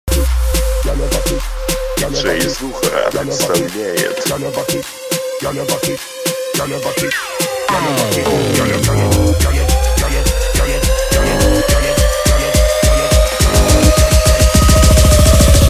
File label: club mix, club music remix